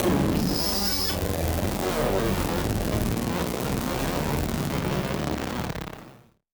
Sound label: Malfo Syn, malfunctioning synth